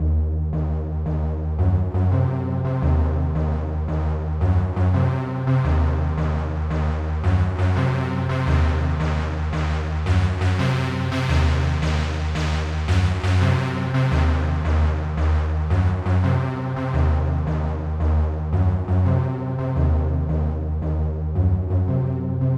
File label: Synth Basstech; Sonic Foundry (Sony Creative Software); Knutrix Drum & Bass